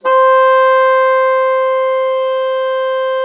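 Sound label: bassoon forte C5; Xsample VOL-6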